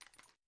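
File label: assault rifle inspect, weapons; gmod; viper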